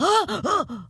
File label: Wound; Creature; Auriaya